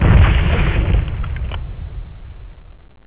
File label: ice explode; Warcraft 3